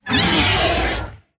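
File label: riding lizard die; ezdovoy yasher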